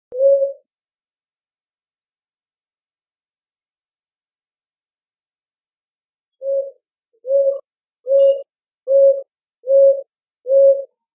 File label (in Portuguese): Rolinha roxa